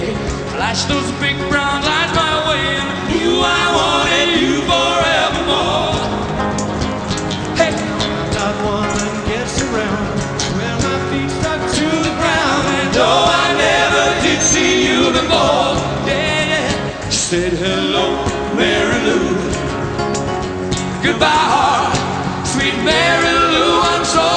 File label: rock